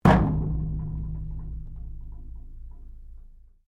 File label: METAL IMPACT; Materials